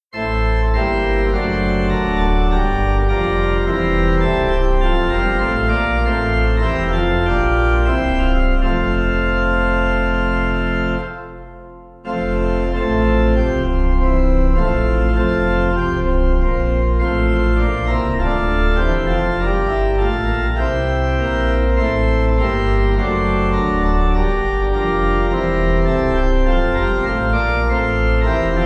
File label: Pipe